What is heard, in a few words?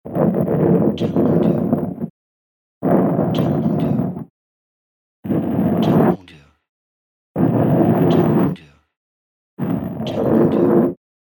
Stone Door Opening